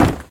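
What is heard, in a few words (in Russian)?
нервный стук кулаком в деревянную дверь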